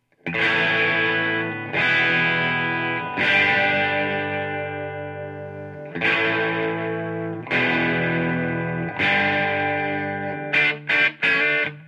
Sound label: OD Strummed Chords